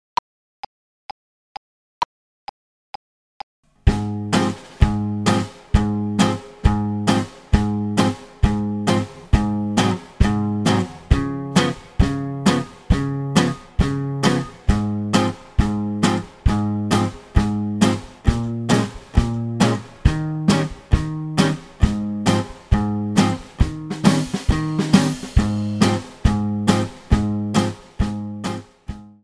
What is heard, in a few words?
blues two beat slow